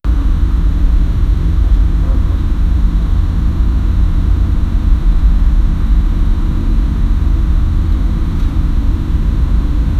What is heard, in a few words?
a crow saying coor; bird